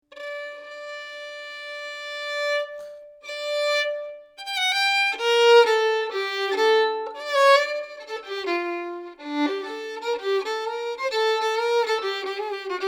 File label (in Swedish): g moll övningstempo; enshult; Gamleby Reunion; korrofestivalen